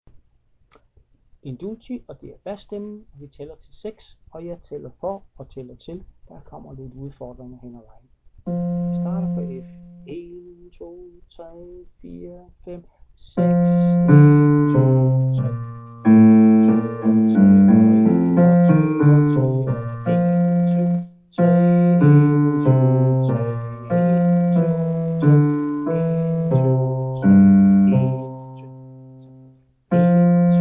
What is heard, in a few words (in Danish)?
bas